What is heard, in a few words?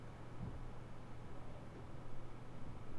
idle noise; Genelec; Loudspeaker Manager; DSP Frequency Response Measurement